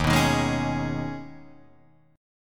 Eb 7th Suspended 4th Sharp 5th, Guitar, Drop D

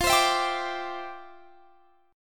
C-Major Add 9th F, guitar; strummed

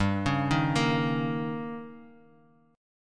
Eb 7th

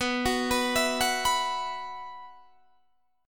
E Suspended 2nd B

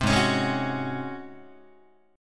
Ab 11th Ab Guitar; strummed; 3,4,4,6,5,5; JGuitar

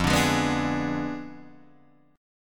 F Diminished 7th F down Guitar Open D

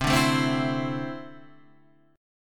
E 7th Suspended 4th Sharp 5th

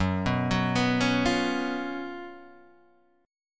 F sharp Major 7th Suspended 4th; F#M7sus4 Chord {2 2 4 4 2 1} arpeggiated

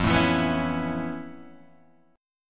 A Major Add 9th F Guitar Standard, chord; Aadd9/F Chord {1 0 2 4 2 0} strummed

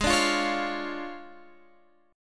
D Minor Major 7th Flat 5th, G sharp

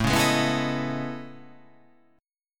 A Major Sharp 11th

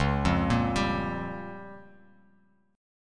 Db 6th Flat 5th Bass Standard, Db6b5 chord; Db6b5 arpeggiated; JGuitar

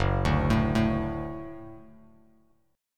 Gb Minor Sharp 5th, Bass Standard; arpeggiated; 2,5,7,2; JGuitar

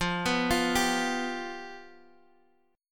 F Suspended 2nd Flat 5th, F, Guitar, Open D; 15,14,15,13,x,x

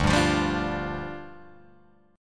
D Minor Double Flat 5th, down, Guitar, Open G

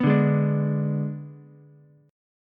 G sharp Diminished G sharp, down, Ukulele; strummed

